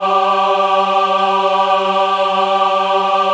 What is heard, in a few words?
G3 MW AH, Choir; USB Soundscan vol.28; Acoustic & Synth; AKAI